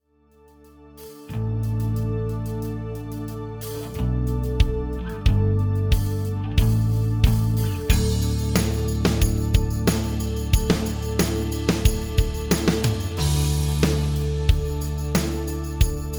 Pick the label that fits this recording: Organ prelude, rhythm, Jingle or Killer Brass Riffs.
rhythm